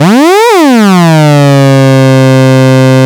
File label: MEMORYMOOG